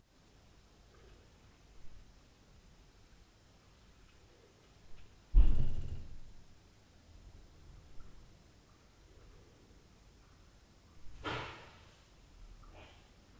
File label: boom Paff Door; Night Noise